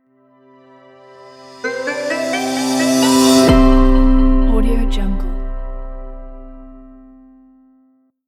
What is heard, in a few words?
elegant logo